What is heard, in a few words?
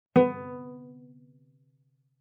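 Cello pizz fortissimo sul A B3; MIS Pitches - 2014; Strings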